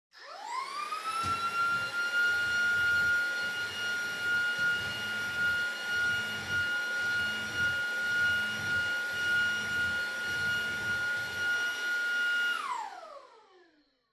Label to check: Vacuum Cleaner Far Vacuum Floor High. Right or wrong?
Right